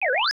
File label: pickup food; Bubble Bobble Remake